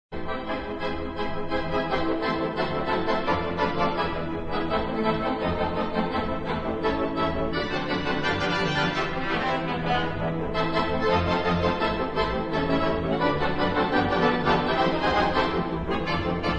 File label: theatre organ